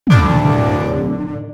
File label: ability electric pulse